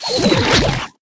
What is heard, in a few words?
pancake drop; Clash Royale